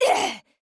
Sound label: DMG